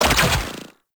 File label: Democracy Fire Start; Warframe; Caliber Chicks Minigame; Weapons